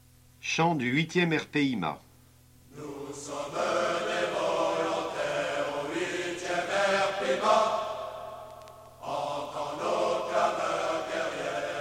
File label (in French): Chant; 8RPIMa; Troupes de marine